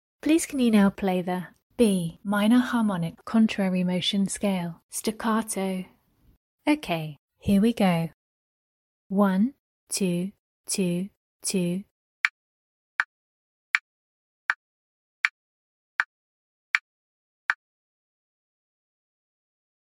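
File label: B minor harmonic contrary motion scale staccato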